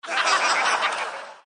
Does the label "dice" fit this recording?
Yes